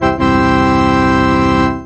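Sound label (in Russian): орган одиночный мелодия высокие радостные аккорды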